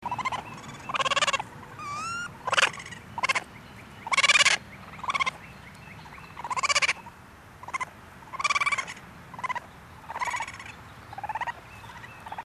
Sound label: baby, raccoons